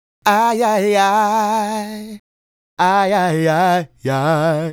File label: Male Solo, Hip-Hop and RnB Vocals